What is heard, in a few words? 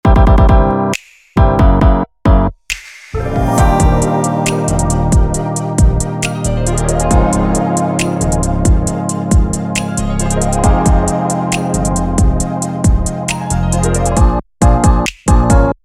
Synth Pop